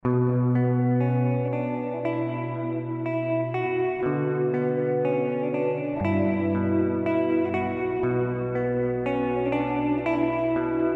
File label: Reverb Space; Specular Tempus